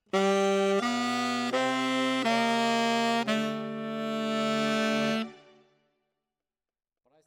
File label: Tenor, RNR for piano